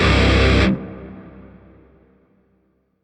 Shawzin Pent Maj Chord A; Music; Lotus Shawzin; Warframe

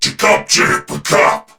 Surprised; Warframe; Battle; CorpusCrewmen; CrewmanC

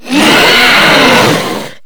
Grineer Hammer Boss Angry, Vocals; Warframe

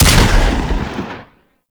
Double Barrel Shotgun Fire; Warframe

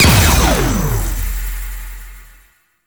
Interrupt Explosion; Warframe; Duviri; Dax

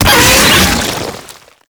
Pain, Vocals; Warframe; Proto Infested; Brachiolyst

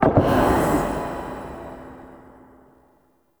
Tarot UI Emotion Click Wrong; Warframe; Harrow; Tarot Emotion Choice

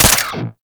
Pistol Mech; Weapons; Warframe; Tenno; Temple Signature

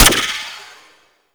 Gorgon Mech, Weapons; Warframe; Void Trader

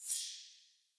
UI XP Tick

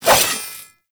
Nikana Swing D; Tenno; Revenant

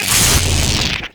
Fire Stop, arc rifle; Infested; Coda Synapse

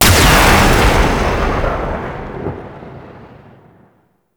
Drop Beam Fire; Enemies; Weapons; Warframe; Tau; Sentient Dactolyst

